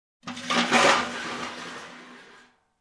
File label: Scrap Metal rattling